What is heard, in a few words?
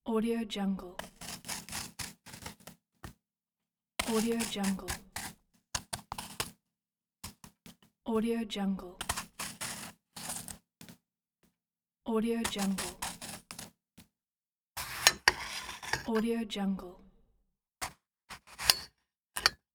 apple peeling and slicing; centralperkproduction